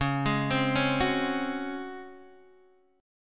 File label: Db Major 7th Suspended 4th Db down Guitar DADGAD